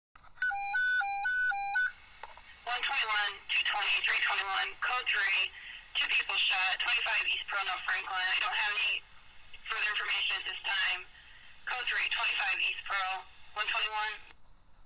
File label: Audio from shooting; Polish Village